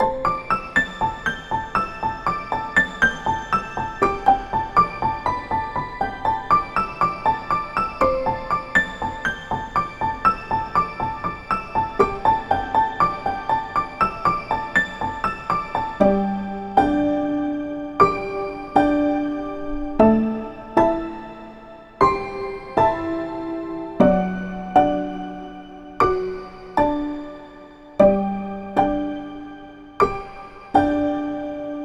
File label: Atmospheric, Cinematic Grand; Toontrack Atmospheric EZkeys MIDI